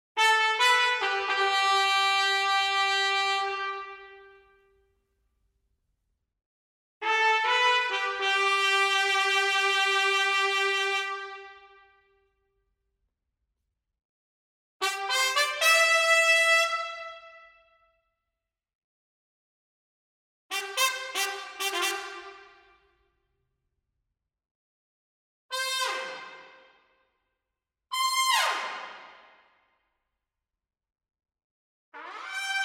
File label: Orchestral Trumpet Artikulationen; Orchestral Tools Glory Days